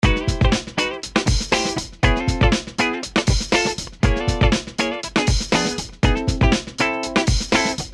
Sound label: guitar jazz